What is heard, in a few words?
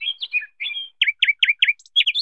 Birds Tweet; Worms3D